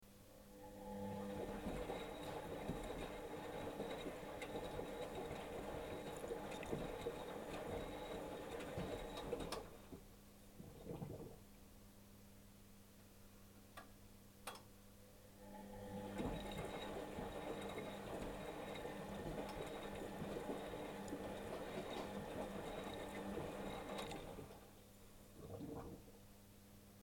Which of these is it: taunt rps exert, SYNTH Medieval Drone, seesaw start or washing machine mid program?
washing machine mid program